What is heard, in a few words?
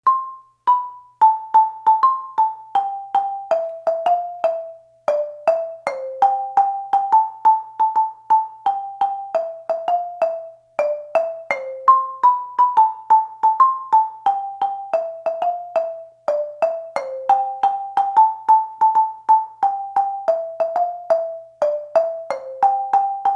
lead intro, marimba